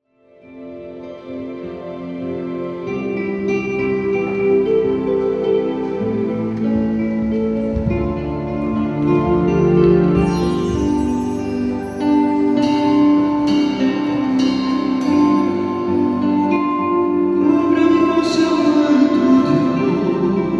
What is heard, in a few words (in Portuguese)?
TENOR SOPRANO E ORQUESTRA